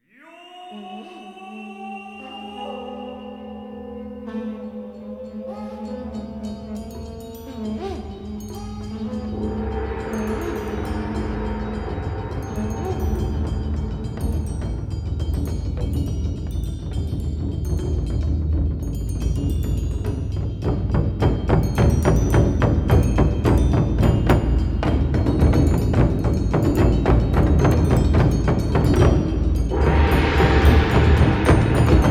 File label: Taiko Drum Opening